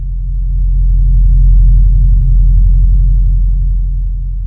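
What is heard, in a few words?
ambience dark force; Star Wars Knights Of The Old Republic II; XBOX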